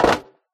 metal fall; Golden Crayon Sounds

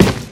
warehouse step; Original TK Sounds

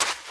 sand step; Golden Crayon Sounds

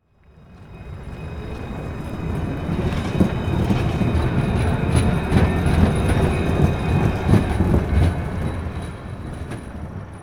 tram passing; Golden Crayon Sounds

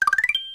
Drill; Salem's Sound Schemes

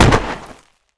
wall dirt; Golden Crayon Sounds

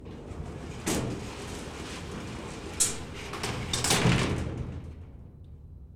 elevator open; Golden Crayon Sounds

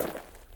dirt step; Golden Crayon Sounds

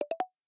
Drill; Justin's New Sound Scheme; Happy Sound Scheme 1

